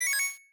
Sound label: beep success; CrossCode; lab